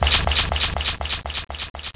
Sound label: SPRINKLR; Custom 3D Home